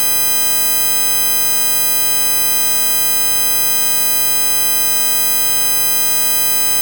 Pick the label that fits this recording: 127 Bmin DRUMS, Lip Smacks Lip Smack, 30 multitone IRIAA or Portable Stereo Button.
30 multitone IRIAA